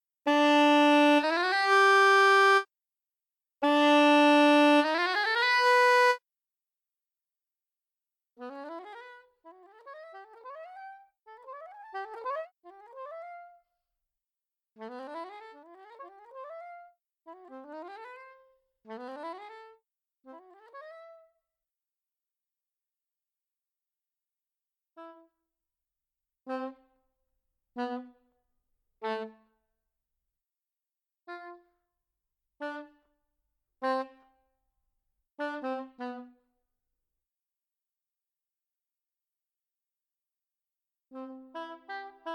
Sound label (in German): soprano sax artikulationen; cmusic; Cmusic Production aus Russland haben das Soprano Sax vorgestellt